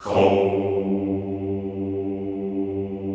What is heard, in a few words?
HOUUU G1, Classical Choir; Best Service; Extended Classical Choir